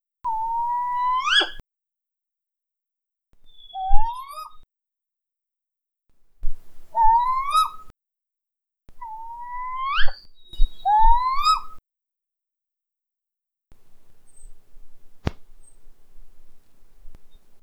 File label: Northern Spotted Owl Whistles